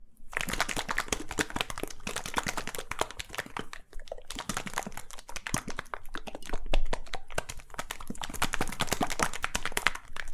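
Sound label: shaking gel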